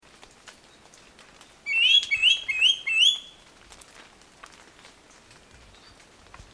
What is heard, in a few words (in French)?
autour a ventre gris, chant